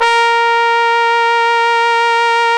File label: A#, Synclav FM, BRASS; Big Fish Audio